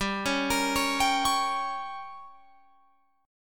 G Diminished, Guitar, Open F; Gdim arpeggiated; 19,20,22,20,22,20

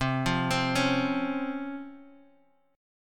C sharp Major 7th C

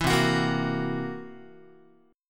C 7th Flat 5th C down Guitar; C7b5 strummed; JGuitar

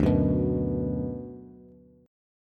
D 6th D down Bass, chord; D6 strummed; JGuitar

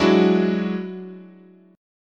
Gb 7th Suspended 2nd Sharp 5th, chord

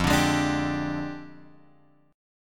F Minor 6th Add 9th F; Fm6add9 Chord {1 X 0 1 3 3} strummed

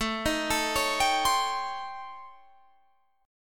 A 7th Sharp 9th Flat 5th A, Guitar Drop D; 19,18,19,18,20,20

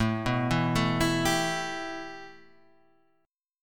A 7th Suspended 2nd A, Guitar Standard; arpeggiated

